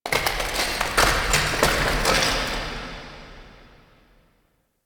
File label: Asteroid Rock Movement; Warframe; Grineer Asteroid